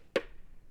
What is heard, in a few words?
splat metal thin dirt; splats; splat metal thin dirt clip